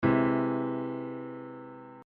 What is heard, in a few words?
C Augmented Root Position Triads; Triads and Pseudo-Triads